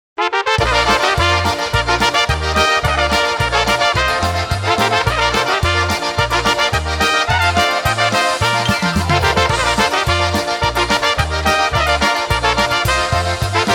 Polka Music

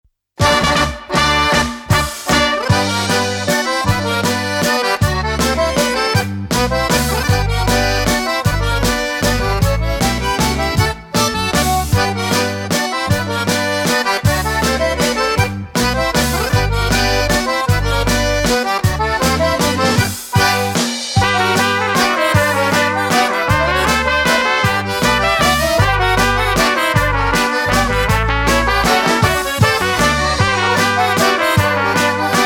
Old Country Kujawiak

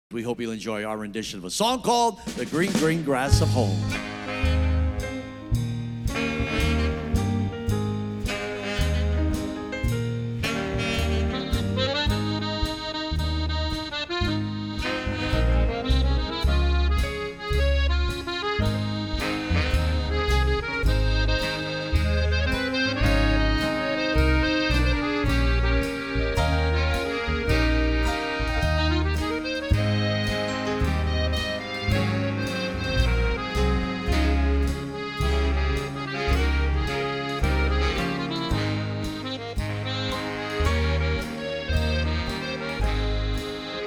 Ballad

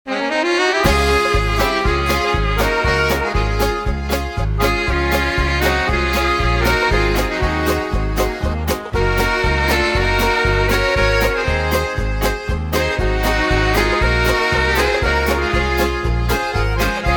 Polka

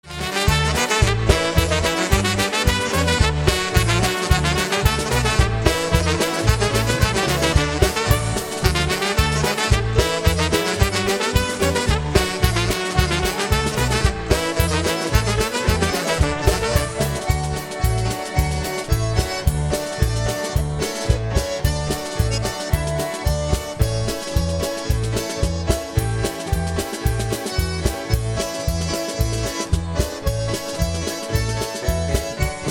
Polka

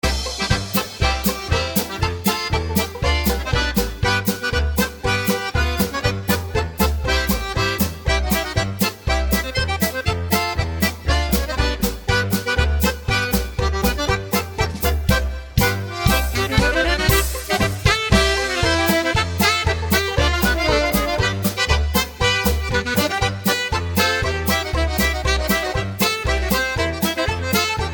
Slovenian Medley

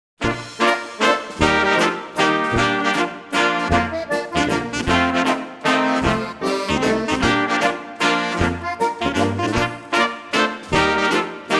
Waltz

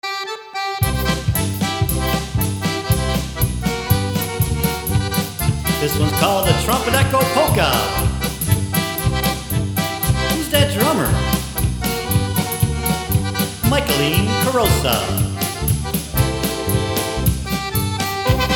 Trumpet Echo Polka

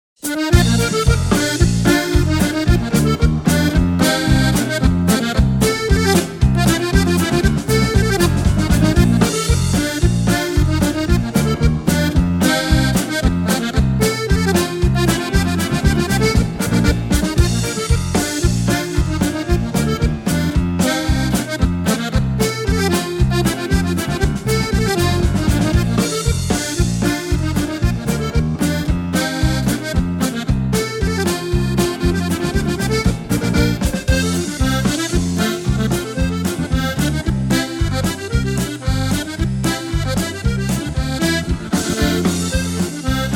Polka

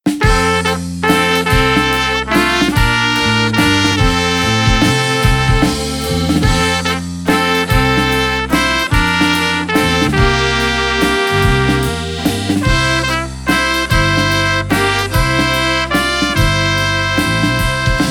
Mountain Valley Waltz

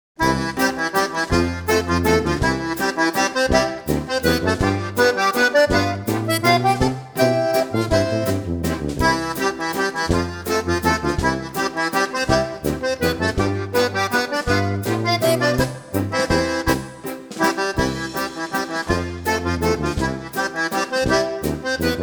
Waltz

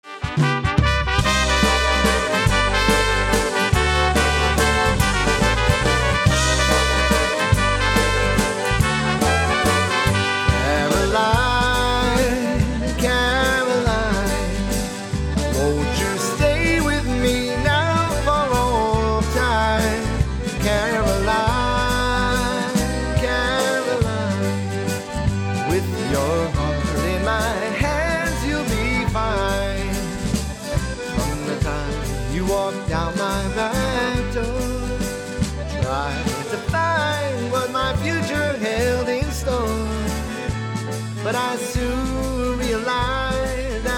Waltz

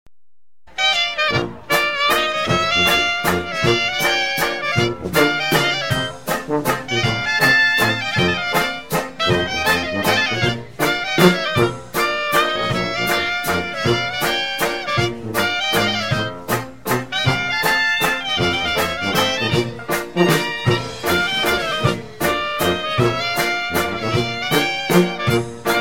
Waltz

